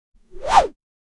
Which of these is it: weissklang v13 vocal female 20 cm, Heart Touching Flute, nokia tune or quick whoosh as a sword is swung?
quick whoosh as a sword is swung